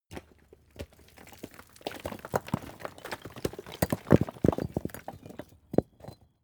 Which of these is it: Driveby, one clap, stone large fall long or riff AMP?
stone large fall long